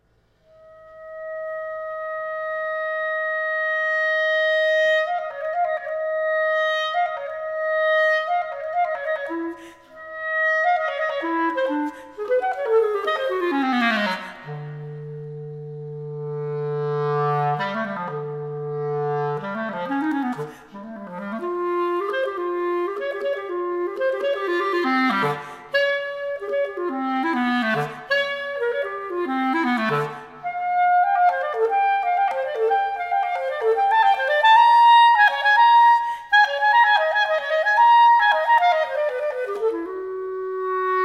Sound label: Solo Clarinet in B-flat; movement 1